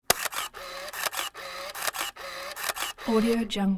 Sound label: olympus om88 slr shutter releases with winder; prosoundfx